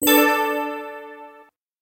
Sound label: alarm ally; interface